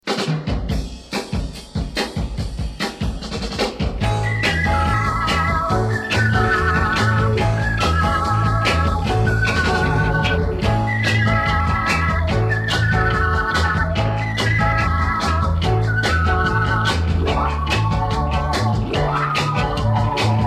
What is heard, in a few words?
Instrumental